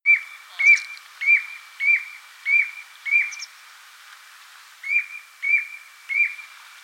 Common Ringed Plover, Sandregenpfeifer - Charadrius hiaticula